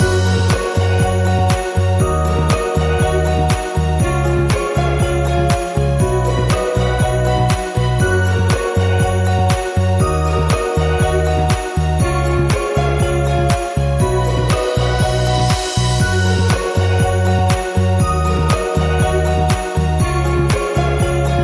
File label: Loop No Melody